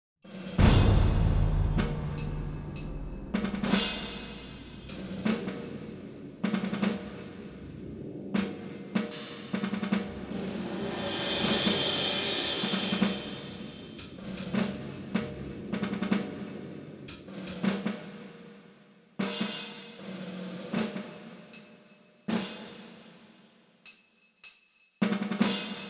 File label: Combat Sim Perc Ambience; Disney Infinity